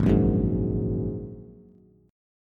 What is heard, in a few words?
G sharp 7th Suspended 2nd G sharp Bass; G#7sus2 strummed; JGuitar